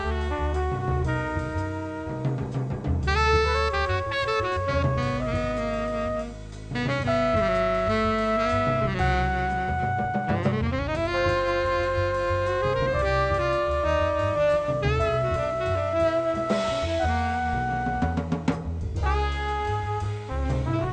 jazz

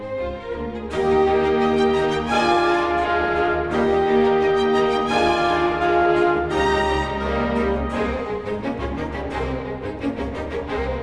classical

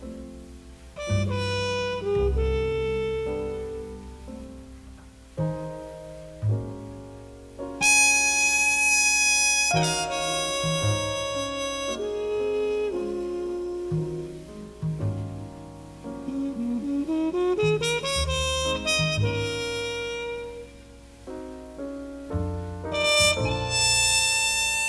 jazz

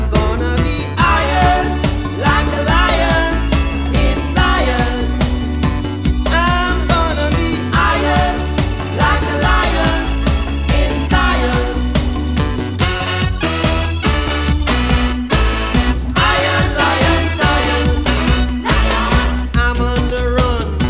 reggae

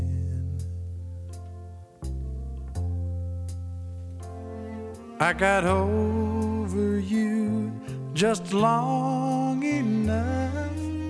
country

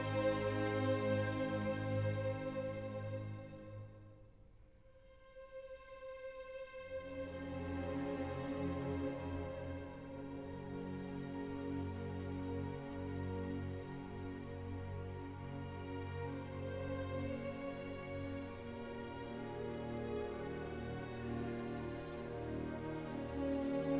classical

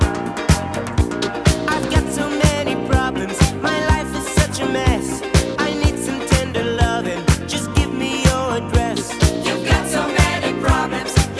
disco

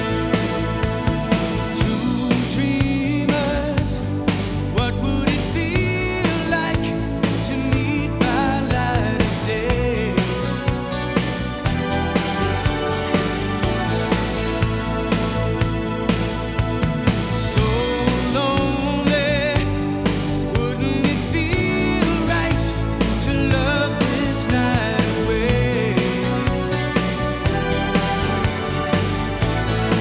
rock

